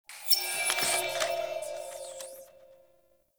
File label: quill pickup; Objects; Pickups; Pensive